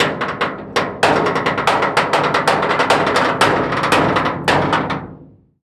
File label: turret, tanks; World of Tanks